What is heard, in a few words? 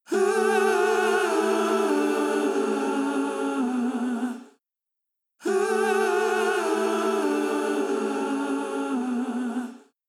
Wider Sound Choir